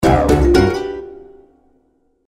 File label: win; Wacky Waves